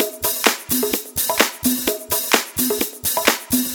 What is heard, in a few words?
Latin House Loop 128 BPM; Vengeance Samples